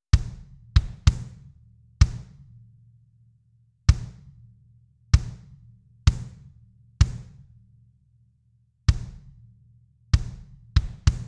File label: Drum Kick ART SCL2 4 1 Attack slow to fast